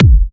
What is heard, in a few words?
Electro Kicks